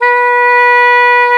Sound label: SAX B2, SAXOPHONE; USB Soundscan vol.63; Balkanish Traditions; AKAI